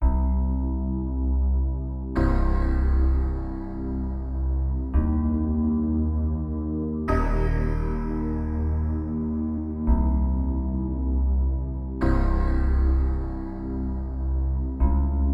music; Extended MIDI; swars

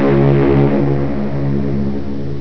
ship horn; Metal Knights

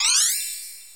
Player Spawn; KNOCKIN

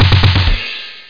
drum roll; Funschool 2

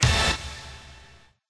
combo

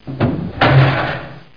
door

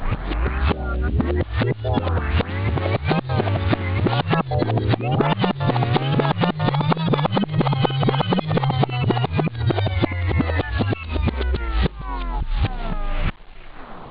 rewind

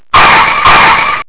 skid; Doom2

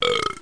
Snake; Play&LearnRC

